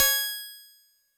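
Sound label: Harpsichord DX100 G sharp 0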